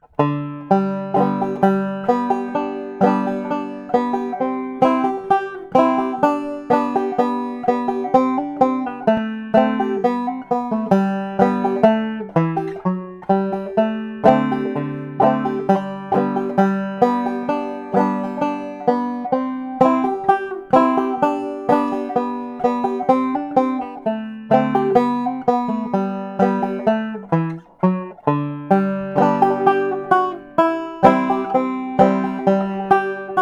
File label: redwing, banjo